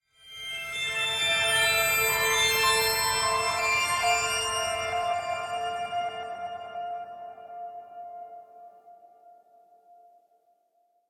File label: Reversed High Chimes Light Mystic Melody - Musical; Hollywood Edge; sound designer's toolkit v2